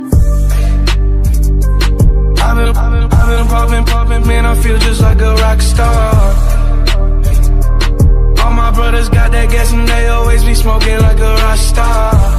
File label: english beautiful; english ringtones